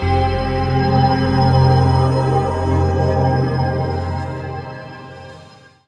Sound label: Hit; Blu Mar Ten; Jungle Jungle - 1989 to 1999 Samplepack